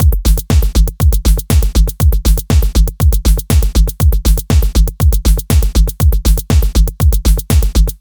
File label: techno